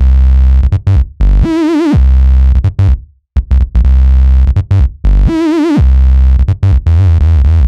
Best Bass, Break Beat

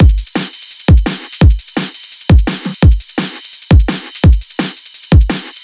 One Beat, 170 bpm

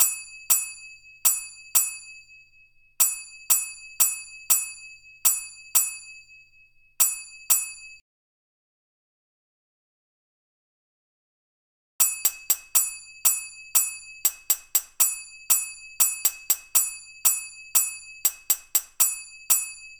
Finger Cymbal Latin 120 BPM; тарелок для пальцев

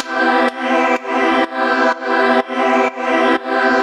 The Pad

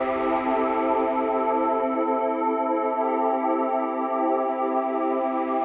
Liqueed Pad, пэды

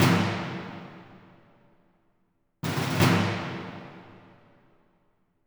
Snare D; сэмплы ударных для фильмов

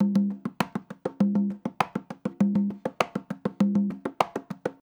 Conga, перкуссия

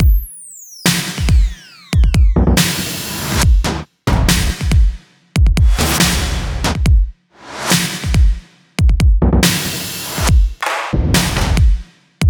Drums B; Trap (Трэп); Butter

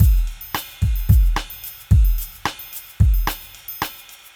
Bossanuevo A

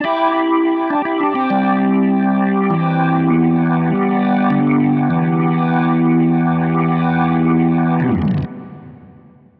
Hot organ; орган

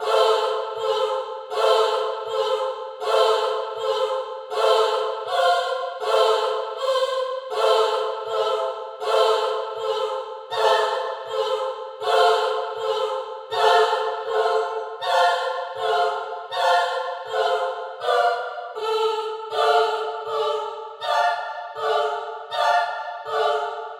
Female Choir

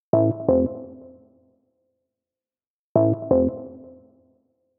Liqueed Dee Jay, сэмпл синтезатора drum bass

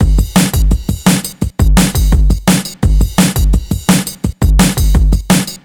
Baby Drums B

